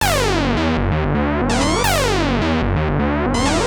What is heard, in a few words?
Analoop, Industrial Analog